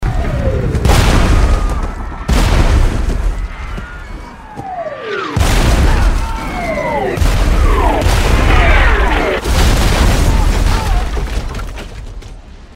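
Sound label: bombing sound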